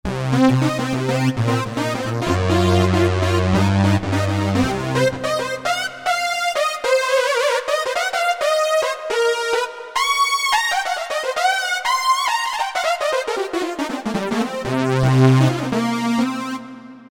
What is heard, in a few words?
Macho Lead, Roland U-220; Factory Patches